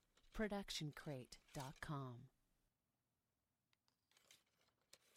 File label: archery foley arrows in quiver